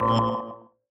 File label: quiz incorrect